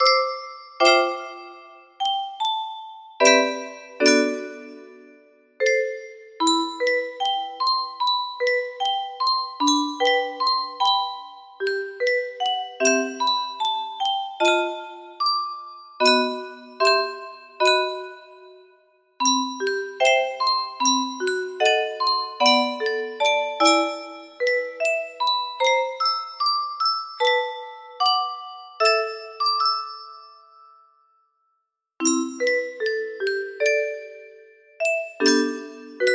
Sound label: lullaby, music box melody; botw